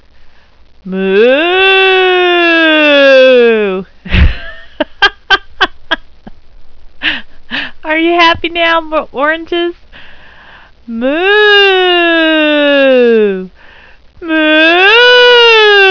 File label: moo